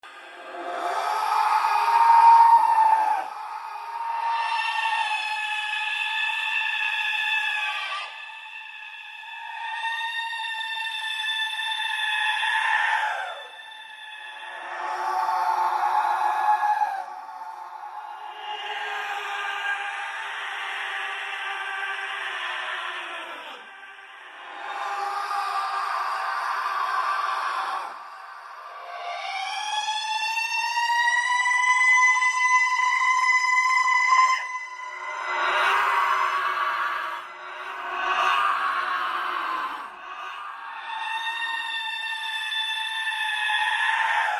ghosts screaming scary surround horror sounds

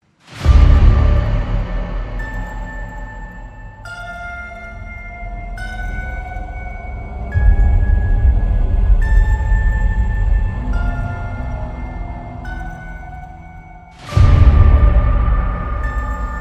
trailer music dark epic horror music

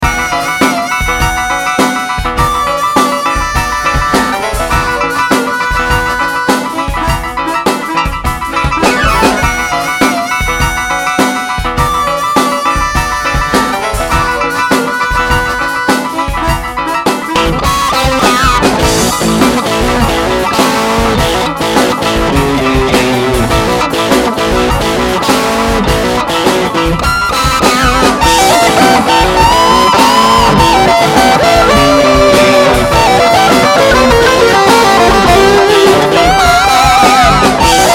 blues

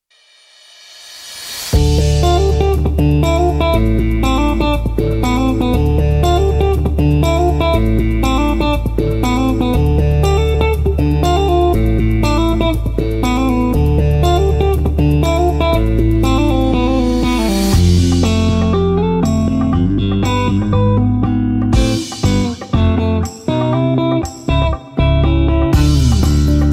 dance bass disco

